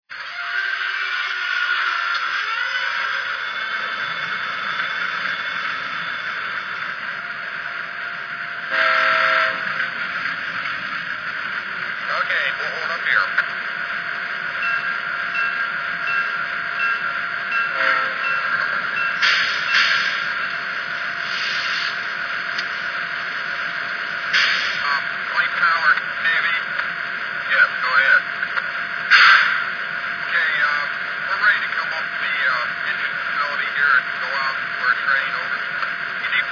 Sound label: U30C, trains